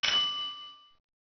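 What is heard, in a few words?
doorbell; Microsoft Graphics Studio Greetings; Microsoft 1998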